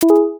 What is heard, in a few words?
comedy happy blip